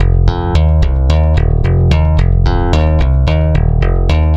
Stick Loop, Stick Bass

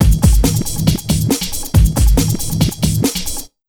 Big Beat Drumloops, 138 BTS; USB Soundscan vol.25; AKAI; MOVE 138

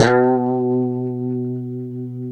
B 2, Guitars; Spectrasonics; Hans Zimmer Guitars VOL-1; 90's Sample CDs; COMP POP+VIB

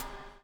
BUBL, BUBBLE; Spectrasonics - Backbeat

